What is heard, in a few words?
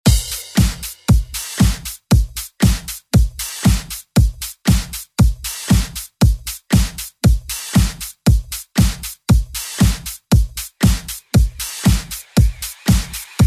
ReDrum; DJ Mhark